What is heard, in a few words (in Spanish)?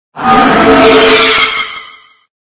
Grito; Dragapult; Gritos de Pokémon de la octava generación